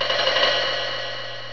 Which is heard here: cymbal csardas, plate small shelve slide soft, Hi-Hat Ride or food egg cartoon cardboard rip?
Hi-Hat Ride